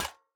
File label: step, coppergolem; Minecraft